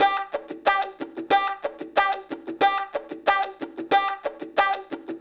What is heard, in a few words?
Call And Response Guitar, 70s Analog Funk